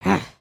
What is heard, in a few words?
Run; Lego Strombie; creatures organic